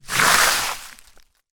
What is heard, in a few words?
foley foot kick dried leaves on small wooden bridge forest